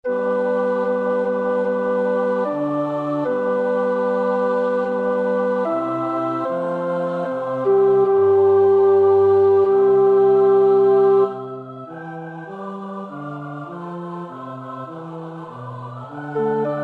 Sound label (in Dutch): tenor 2; Carissimi (Giacomo); Historia di Jephte